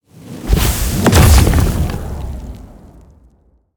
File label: Monster; Zenless Zone Zero